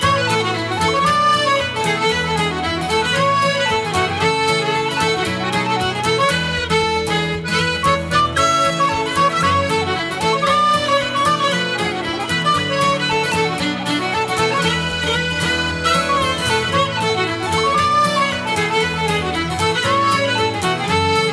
Shop Loop; Granblue Fantasy Versus

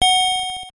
Bell; Mike Tyson's Punch-Out!!

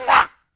bird collision low

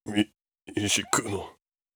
in Pain

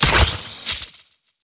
chargeup skill; Gonner

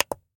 Switch Push; Five Nights at Freddy's Final Hours 2

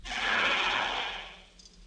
enemy death; Gonner; enemydeath 02 downworld 1

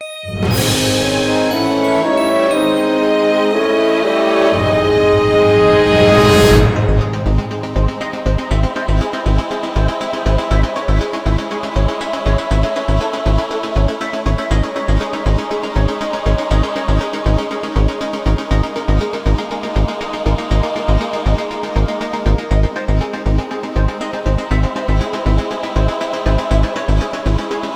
All Clear; Shantae Half-Genie Hero

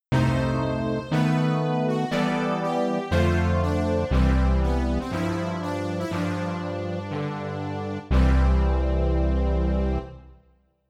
The End (IBM-PC GeneralMIDI); Hocus Pocus

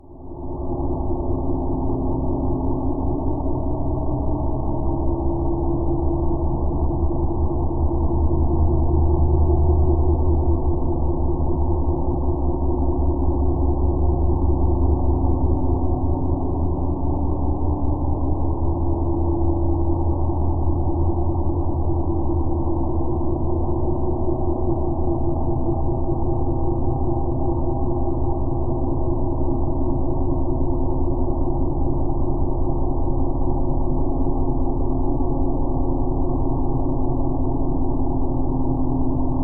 cell interior; Planetfire

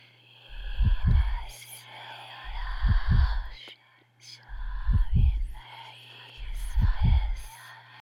heartbeat slow; yamiboss

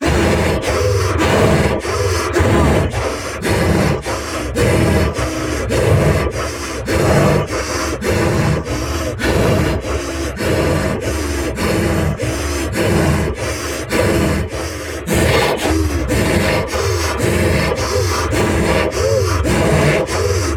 Chase High

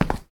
Tile long

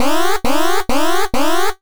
Warning; Bubble Bobble also featuring Rainbow Islands; Saturn

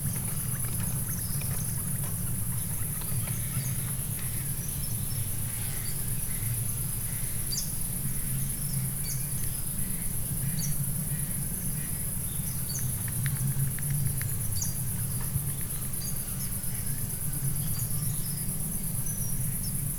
Ambience desert

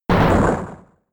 Hammer; Wood Hammer

